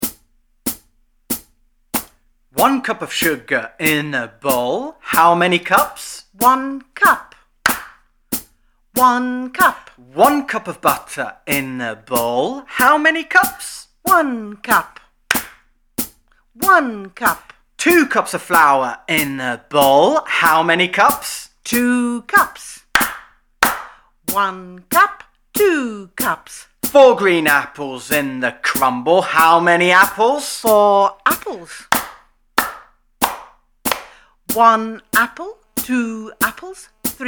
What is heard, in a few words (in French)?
chant